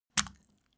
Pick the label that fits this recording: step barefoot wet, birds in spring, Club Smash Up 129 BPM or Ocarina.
step barefoot wet